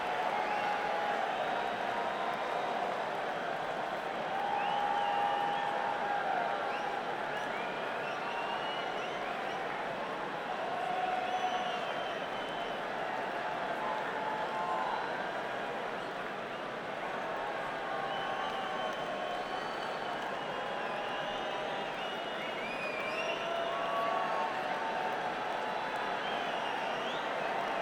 Crowd, Madison Square Garden; New York, NY

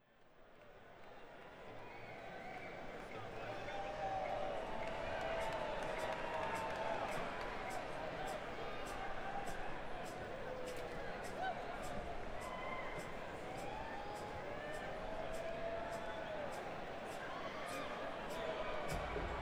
Crowd; Las Vegas, NV - MGM Grand Garden Arena

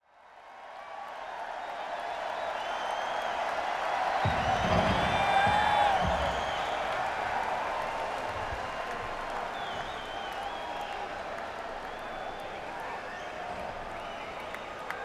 Crowd, Madison Square Garden; New York, NY

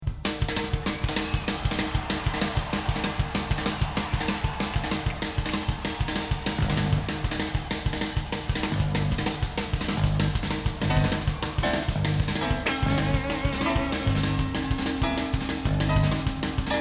Big Ball Jam

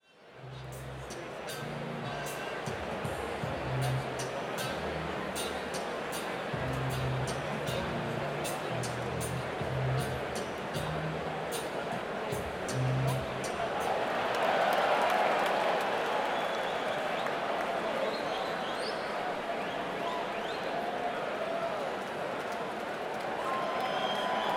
Applause; East Troy, WI - Alpine Valley Music Theatre

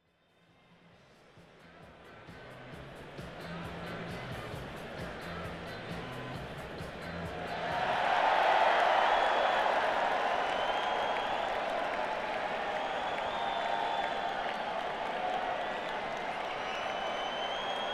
Crowd, Madison Square Garden; New York, NY

